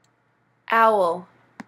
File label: owl